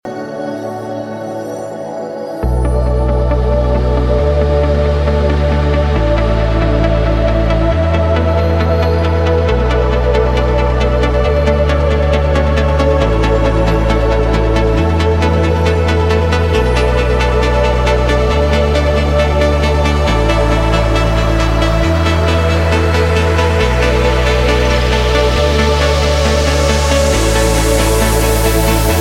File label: xtreem techno; rock